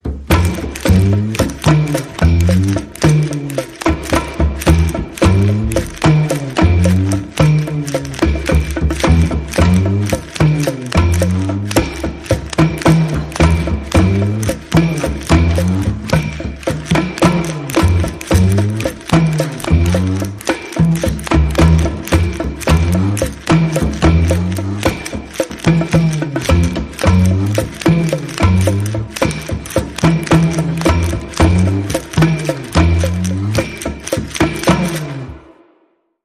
No Vocals

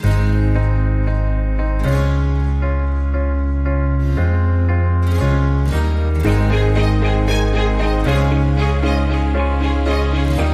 Inspiring Moments, No Drums

